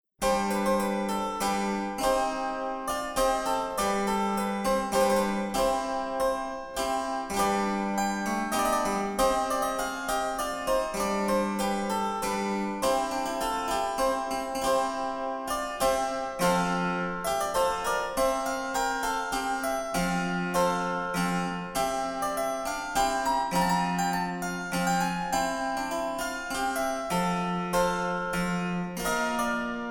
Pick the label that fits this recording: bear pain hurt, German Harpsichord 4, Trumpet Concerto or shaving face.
German Harpsichord 4